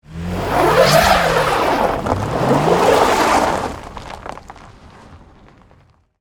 Tires Spin Snow, Transportation, Automobile, Tires, Spin, Snow; Saturn, Vue; Car; BlastWave